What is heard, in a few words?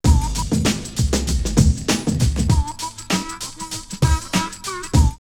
Bluesy 98, drumloops OldSchool; X-Static Goldmine